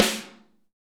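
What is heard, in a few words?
snare ambient; Best Service; Real Mega Drums VOL-1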